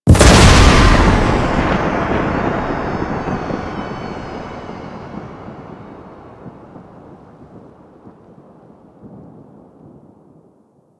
Infested Golem Engine Thruster; Warframe

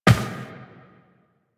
Step Glass, Footsteps, Walk; Warframe; Tenno

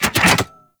Gas City Mechanism Lock; Ambience; Props; Warframe; Corpus Gas City; Props Remaster

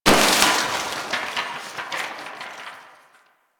Desktop Book Destroy; Warframe; Ambience; Entrati

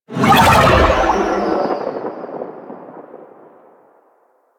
Insect Liset Fly By Quick, Vehicles Flight

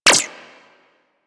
Shield Impact; Warframe; Warframes; Volt

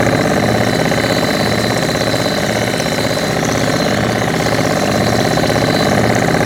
Salvage Submarine Loop; Orokin Salvage Submarine Loop; Warframe; Grineer Ocean

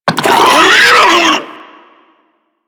Infested Ancient Attack; Enemies; Warframe; Puss Ancient

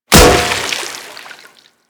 Staff Impact Water

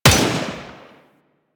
Tommy Gun Shot 3D; Weapons; Warframe; Tenno

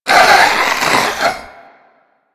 head shake; Warframe; Stalker

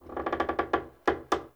Dendrit Structure Wood Creak Small; Ambience; Fort

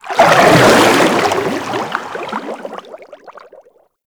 Sharkwing Roll Whoosh, Vehicles; Warframe

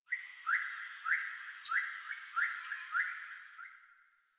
Tree Critters Happy Calm; Ambience; Props; Warframe; Duviri

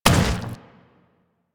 Zombie Step Metal, Footsteps, Walk

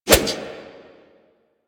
Wolf Swipe; Animals; Warframe; Quests; Duviri

